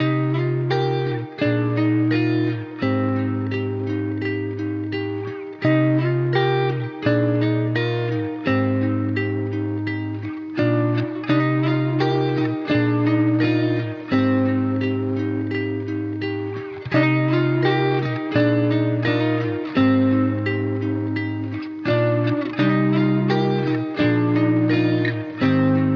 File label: Guitar, 85 BPM C Min; Full Moon; Cymatics; Miami Guitar Pack - Pt 1